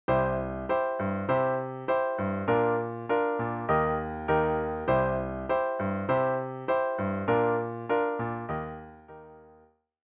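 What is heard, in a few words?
vamp, piano rendition of the chords, C Bb Eb; song intro; 3-Chord Vamps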